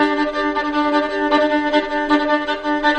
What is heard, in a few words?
violin; fiddle